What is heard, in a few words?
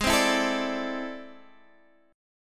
A Minor Major 11th